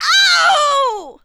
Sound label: Female Mad Scream